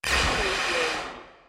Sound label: Synth Stp